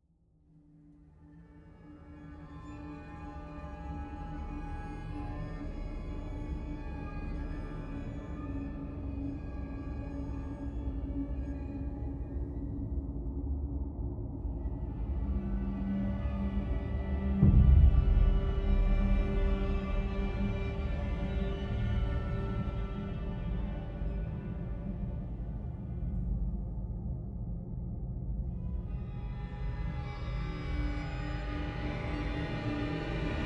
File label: Ambient Idea Nonloop